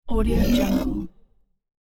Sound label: monster dark roar